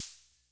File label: Kay R-12 Rhythmer Snare Hat, Drum Machines; Kay R-12 Rhythmer Sample Pack; 300 Drum Machines